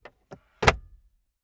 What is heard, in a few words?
glove compartment close; transportation